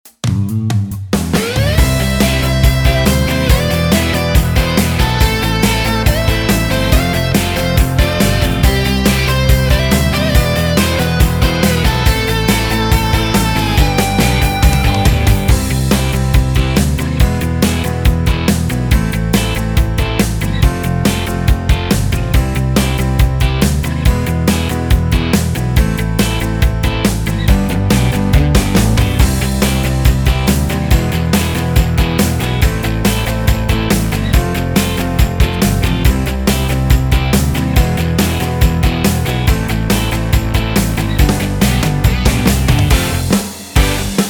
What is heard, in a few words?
Midi Yamaha; general midi